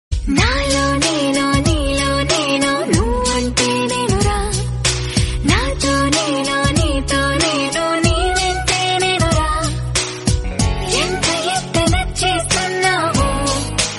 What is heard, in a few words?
bgm south